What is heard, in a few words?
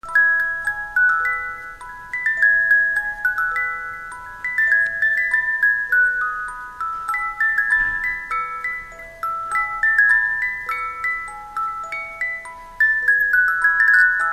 violin